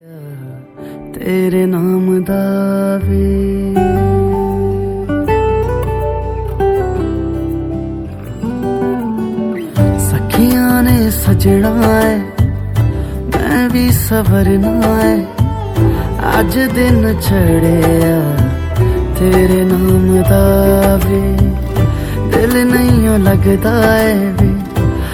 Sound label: bollywood ringtone